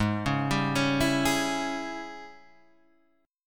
G sharp Major 7th Suspended 4th Sharp 5th, Guitar, Half Step; 5,5,6,7,6,5